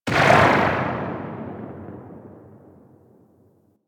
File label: Anti Aircraft Gun Tail A; Weapons; Warframe; Archwing